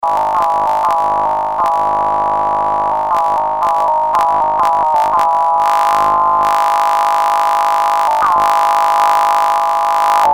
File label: formant sync; Red Sound Darkstar